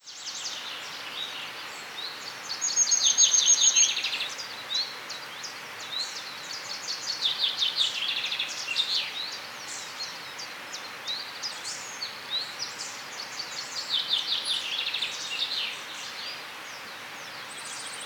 Rhone and Arve rivers Canyon

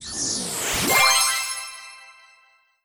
Se Sys Bonus Time

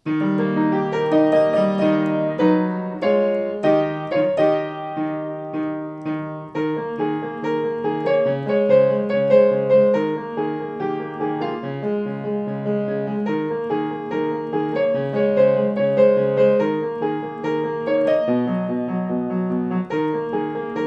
Victory Fanfare, Piano

